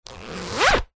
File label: plastic document wallet zipper; office